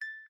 Video Record, ui; Asus; Zenfone-3-Deluxe

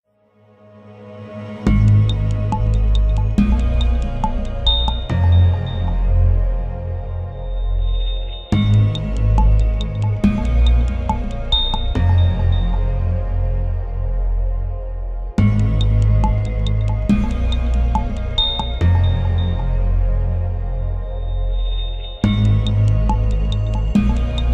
Drill

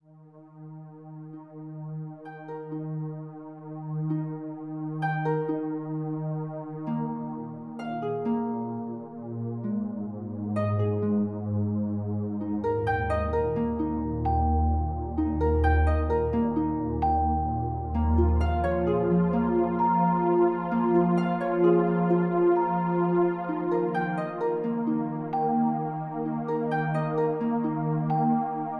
Harp from the Moon; LG Wing 5G Verizon

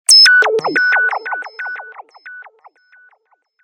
sci fi, notifications; HTC One-X

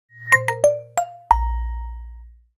phone start up; Nokia Asha-500

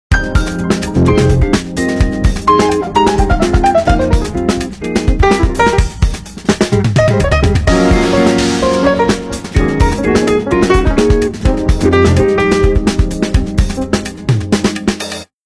Nokia 3600 slide Ringtones; Canvas

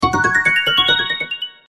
sms; Huawei C5800

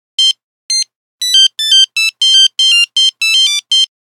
Nokia Buzzer Jumping; Windows Phone apps

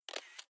camera; Sharp; camera M43; AQUOS sense lite SH-M05

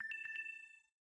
Low Battery In Call; LG; V31 isai VL

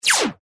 Error

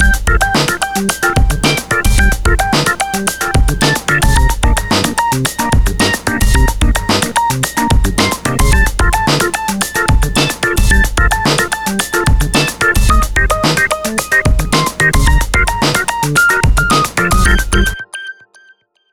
Organ Note; Sony Ericsson; PlusFourSix

